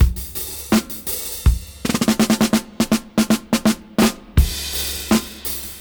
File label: Gresy 82 BPM fill; AMG - Keith LeBlanc Phat Butt Jams